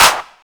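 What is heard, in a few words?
deep house clap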